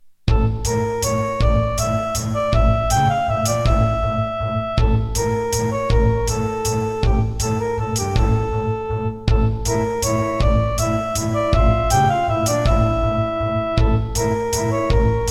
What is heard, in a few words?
Casio GZ-50M